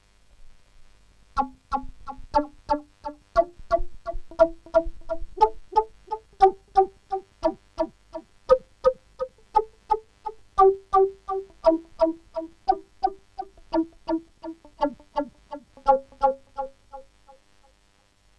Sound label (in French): effet staccato, flute a bec